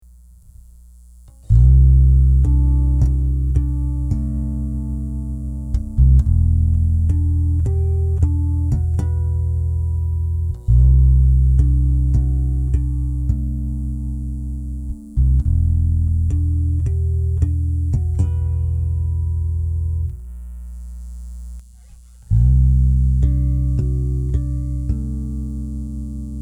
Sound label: Serendipity harmonics